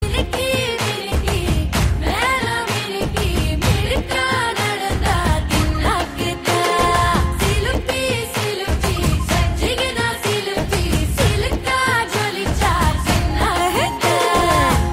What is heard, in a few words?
Tamil Song; Thangalaan